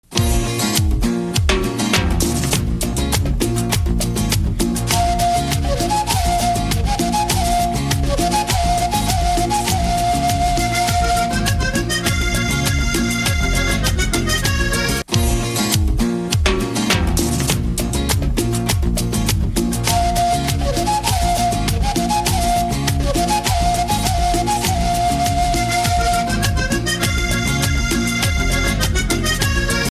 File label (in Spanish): MÚSICA DE COLOMBIA